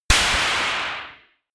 gun clapping pop gun; schusswaffen

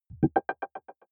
button echo falling; maschinen; signale; soundpool

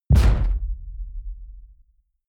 sci-fi space pirate gun fire clean; pistolen gewehre

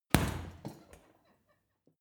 boxing punching bag hit wiggle; sport-freizeit; boxen

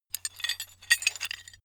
metal handling pieces

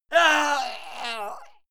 male fight pain; schreie; menschen; soundpool

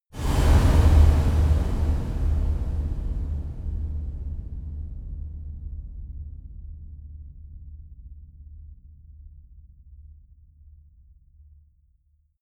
reverb metal soft large

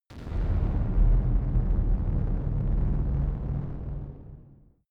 heavy hell door

